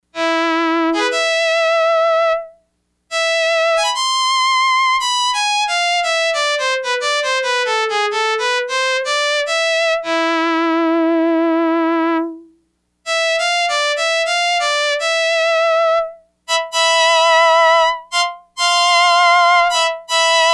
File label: Solo Violin, Yamaha DX100; Factory Patches